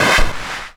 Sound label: Startup